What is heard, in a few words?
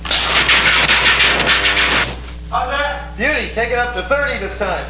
shock